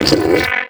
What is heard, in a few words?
HURT; mahlendur